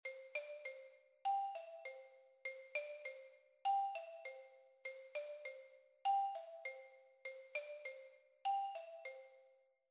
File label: Ostinato; composing at home